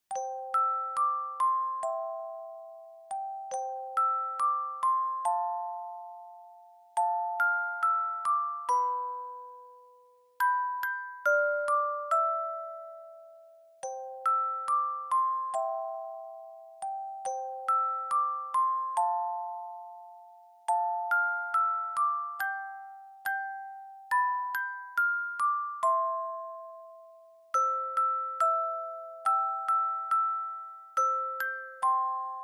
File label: Dry Slow; bgm; Jingle Bells